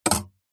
clothes hanger metal wire hang hook onto metal wardrobe pole; household